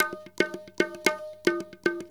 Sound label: India, Heart of Asia